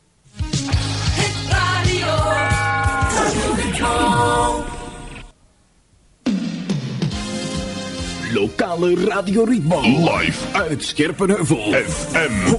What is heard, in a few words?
jingles; Ritmo